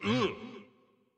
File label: Hurt; Dinkubus